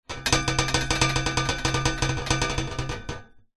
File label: foley metal frame hollow loose wobble rattle